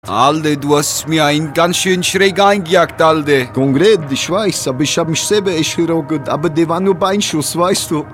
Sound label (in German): erschrocken; css; skyesports